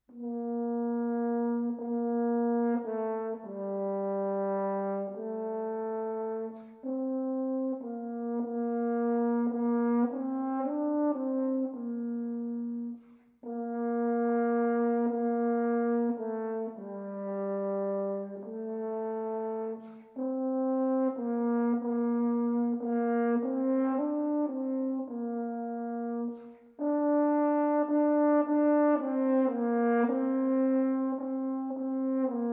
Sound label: Horn Exercise